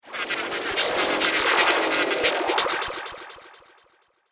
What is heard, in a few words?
Aliens Digital Stew; Alien Sound Effects; science fiction sound effect